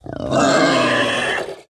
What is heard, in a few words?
Razorboar Attack